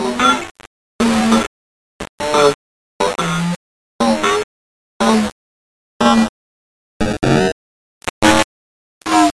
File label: Static Radio Glitches; bottlerocket